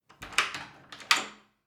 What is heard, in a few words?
Door Lock Key